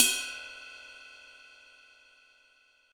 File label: large room drum crash sample d sharp key